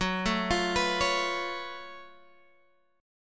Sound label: Gb Minor Major 11th Gb Guitar Open G